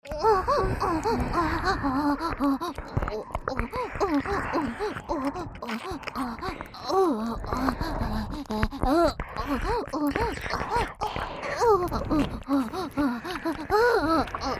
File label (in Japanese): オホ喘ぎ SE無 ＋両耳舐め; おまけループ（オナサポ）; 3WAYループ